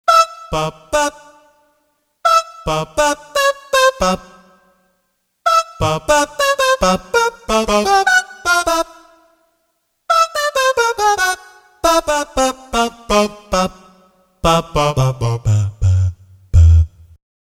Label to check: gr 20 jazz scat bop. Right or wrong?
Right